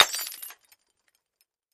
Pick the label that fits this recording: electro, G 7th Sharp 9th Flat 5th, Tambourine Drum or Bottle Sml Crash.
Bottle Sml Crash